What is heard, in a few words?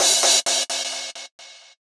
Gated Cymbal; musicradar